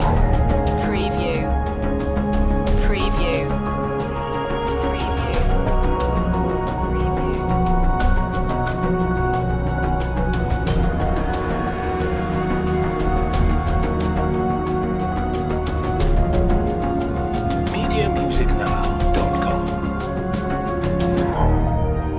sirene call

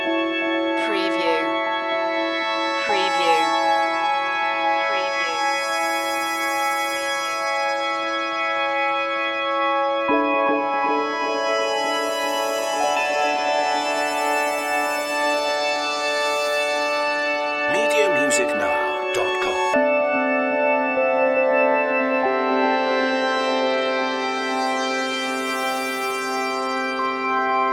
crystal underworld long looping

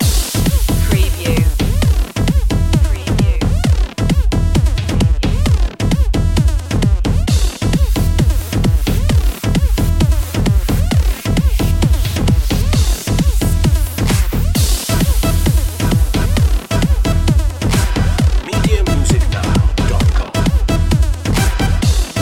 laser motion

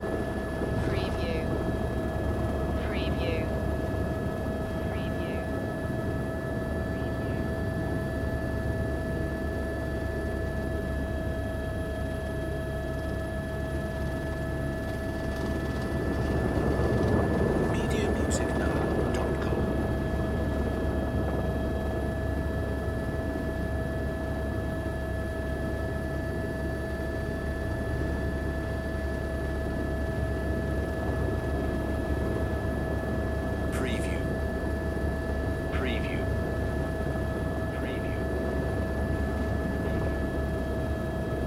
Helicopter Flying Ambience

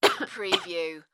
Short Cough

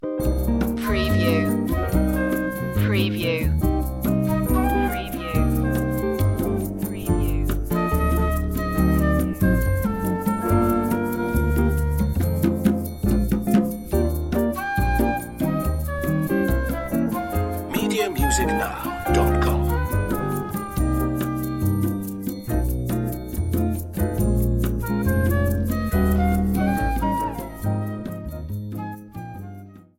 bossa

